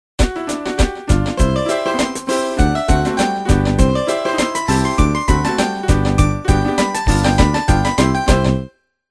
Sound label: right backing